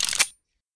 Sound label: 357 hammer release, weapons